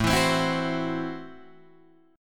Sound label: A Minor 6th A Guitar DADGAD